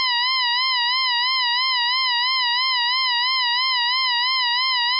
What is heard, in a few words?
Vibrato Impulses Noise Harm